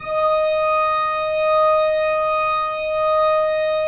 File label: pipe organ, 3-D Audio; E-MU Producer Series Vol. 5 – 3-D Audio Collection; 3D Organs; 3D Pipes Dr Joyce